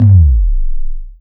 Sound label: KICK R; USB Soundscan vol.20 - Fresh Disco House I